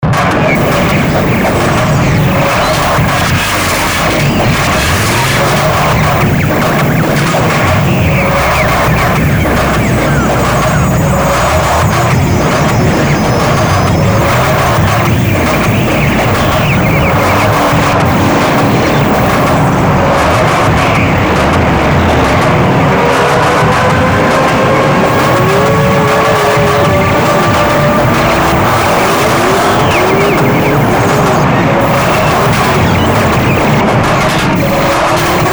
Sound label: Noise